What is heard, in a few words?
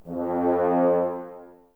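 French horns, Brass, Woodwind Brass Ensemble; Miroslav Vitous - Woodwind Brass Ensemble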